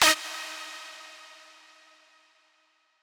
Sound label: lead